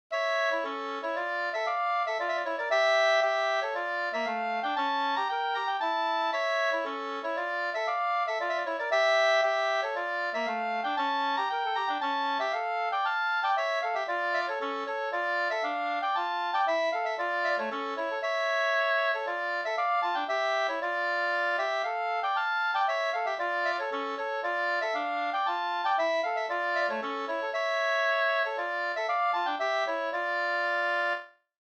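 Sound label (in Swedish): polska, tvåstämmig; Rattvik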